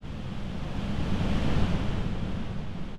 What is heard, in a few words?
filtered synthetized Noise